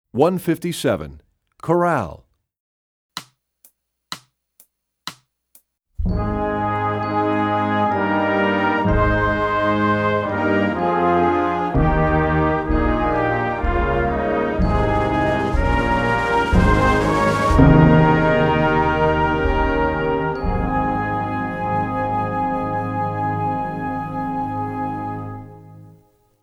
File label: Chorale, Percussion; Rubank Studies; EE2000 Book2